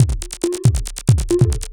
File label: percussion loops, 138, Techno